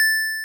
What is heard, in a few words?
synth A7; osu